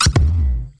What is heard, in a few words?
down charge shoot; GONNER